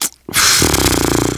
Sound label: negative noise, survivor voice; manager; Left 4 Dead 2; left4dead2 dlc3